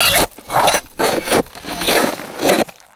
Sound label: metal shovel scooping crunchy snow; tools